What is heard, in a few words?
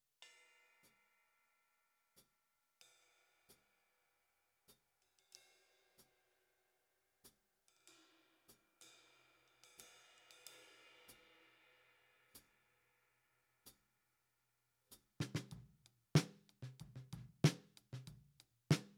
Snare Top, 94bpm